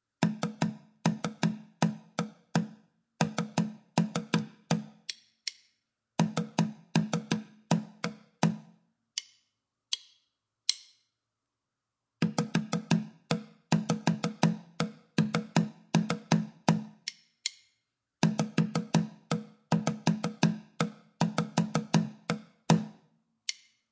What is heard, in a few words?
Drumming